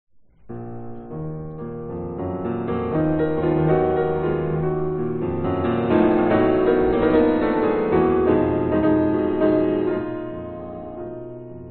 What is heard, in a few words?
Two Preludes E-flat minor Con moto